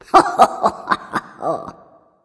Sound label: laugh; smite; ratatoskr